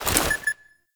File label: Resource Pickup NPC Item; Warframe; Abyss Of Dagath